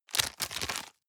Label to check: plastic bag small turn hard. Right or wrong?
Right